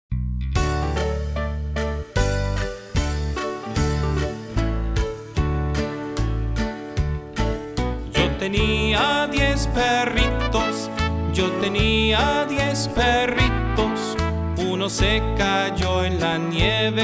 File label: Diez perritos, Spanish Song